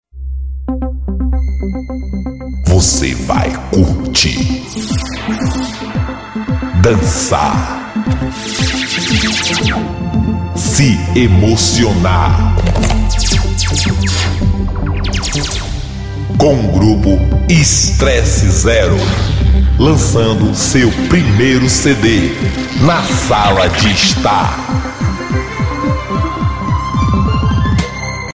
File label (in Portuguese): trilha